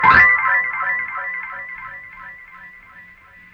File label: Stab Road Sweep; One Shots; Ableton Live 12 Lite; Core Library